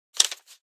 Banknotes count out into hand

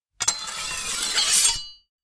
Sword sharpening single and long scrape

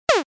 Arcade 8 bit shot

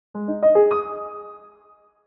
Video game reward chest opening minimal piano

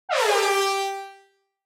Reggae air horn G3

